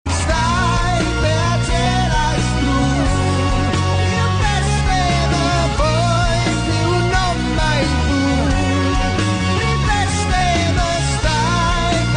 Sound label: Drum